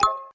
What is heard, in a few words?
button check; Ninja Jump Force